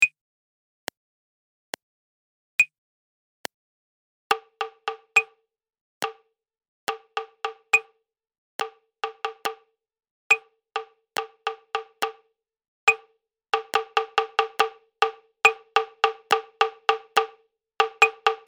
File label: Prepared Rhythm; musicianship ii